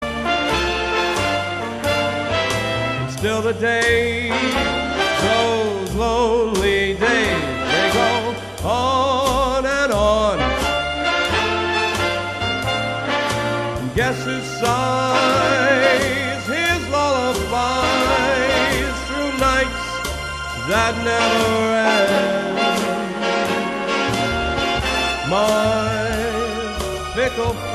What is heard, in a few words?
short, tribute